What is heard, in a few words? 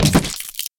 Tank door crash bones